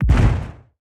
damage hits explosions; World Of Warships

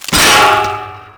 mohole pin snap

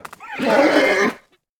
attack; Rust

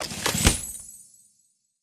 chest open

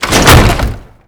target pop up wood; Call of Duty Modern Warfare

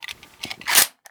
insert clip; Rust

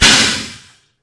Mi-8MTV2 Mi8 brakes off, aircraft; DCS

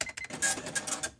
trade operation complete; Escape from Tarkov

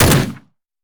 revolver attack heavy; Rust

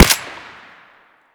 saiga fire silenced close; Escape from Tarkov

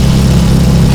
fire loop npc far

